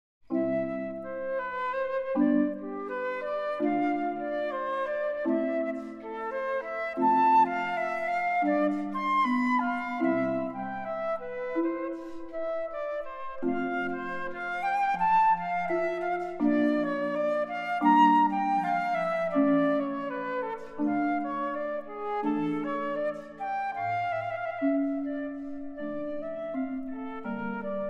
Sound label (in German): Sonate C–Dur, Andante; Johann Sebastian Bach; BWV 1033